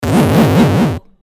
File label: 8bit wrong